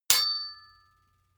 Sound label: sword metal hit ring long